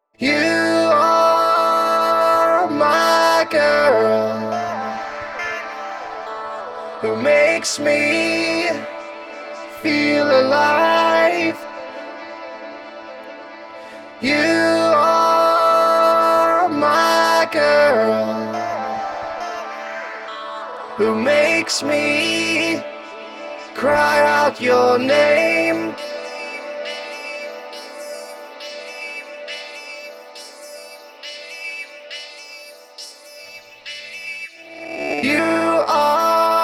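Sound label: vocals loop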